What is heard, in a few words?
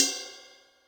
Bell A, Yamaha DTXpress Cymbal